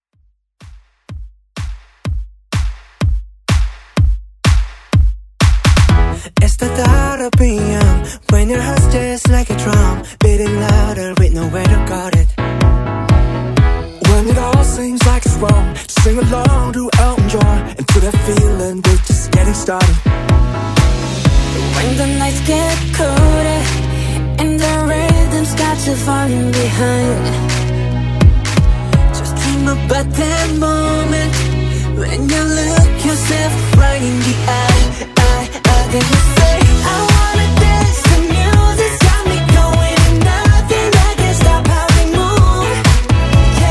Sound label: KPop Redrum